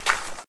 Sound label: sand footstep